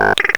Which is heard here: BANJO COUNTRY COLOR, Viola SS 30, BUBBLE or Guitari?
BUBBLE